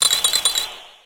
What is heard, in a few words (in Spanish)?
Grito de Vanillite